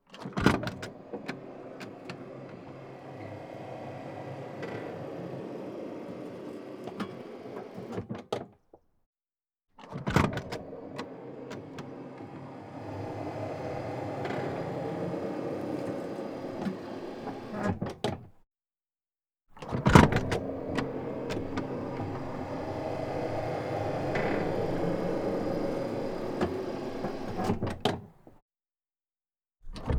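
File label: exterior Troop Door Open, Warrior IFV; Mono; Custom Vehicle Recording; Hammer & Spark Audio Production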